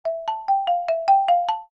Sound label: marimba